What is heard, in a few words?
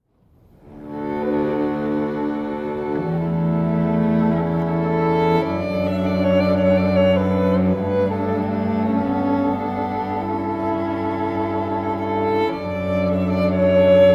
oboe